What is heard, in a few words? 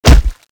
hit; csco; csgo; weapons